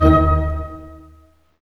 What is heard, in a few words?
HIT STAC, Staccato Oct; Roland L-CD702; Dynamic Orch